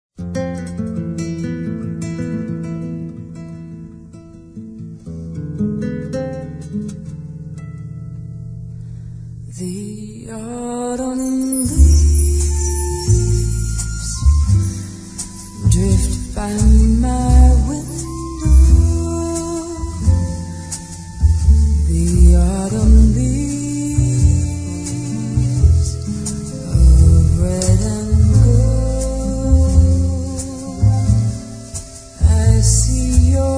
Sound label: Smooth Jazz